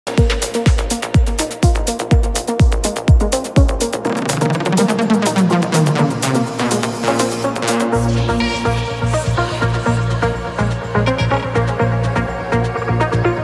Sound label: melodic techno progressive